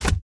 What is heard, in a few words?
Human body parts being chopped or amputated. Mutilation, Body Dismemberment, Gore Chopping, Flesh